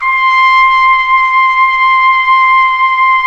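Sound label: trumpet p; Roland L-CDX-03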